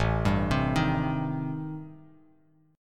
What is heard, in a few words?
A-Minor 7th Flat 5th, Bass Standard, chord; Am7b5 arpeggiated; JGuitar